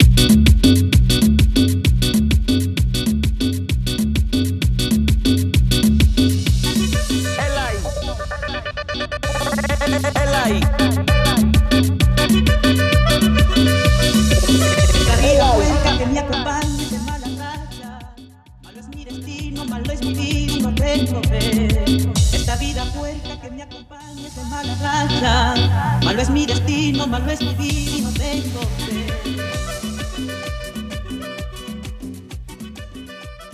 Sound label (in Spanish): acorde